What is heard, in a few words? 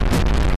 rock rolled